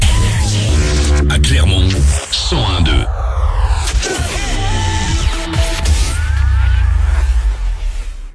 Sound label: JINGLE